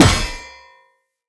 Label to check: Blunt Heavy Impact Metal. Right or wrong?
Right